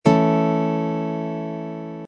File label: a e slash chord voicing, guitar